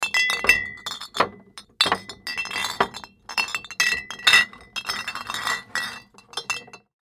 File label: Beer Bottles Empty, Beer Bottles Empty Set Down On Table; Foley; Blastwave